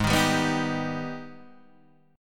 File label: G sharp Minor Major 7th G sharp down Guitar Open D